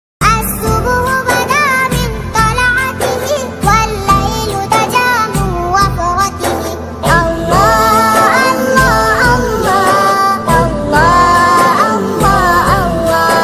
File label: allah allah allha naat mobile ringtone islamic cute baby mobile ringtone